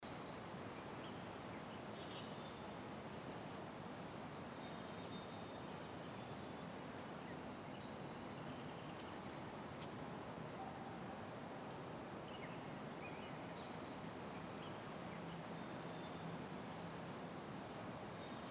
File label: School Ext Ambience